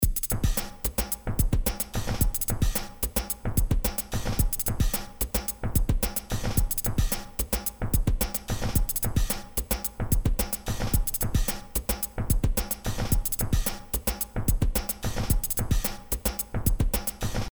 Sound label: funky sample preset; stix